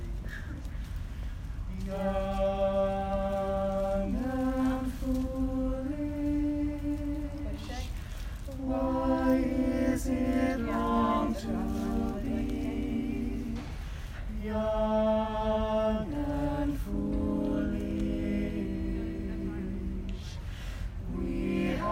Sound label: Jazz ensemble